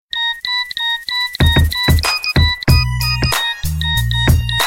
Nokia Sms

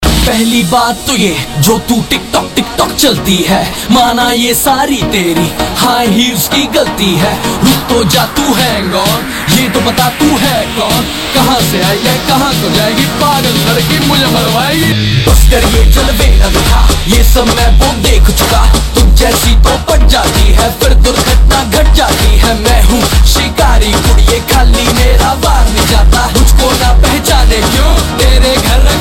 Ring Tone

